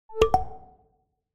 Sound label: notification